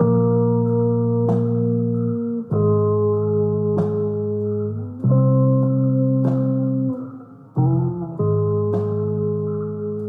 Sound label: bass